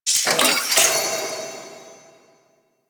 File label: Boss Assassinated UI Pop Up; Railjack; Grineer Galleon Boss; Galleon Ehra Rok; Cinematics